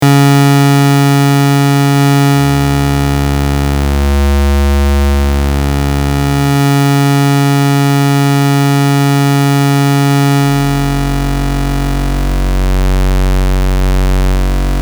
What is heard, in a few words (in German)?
Suboszillator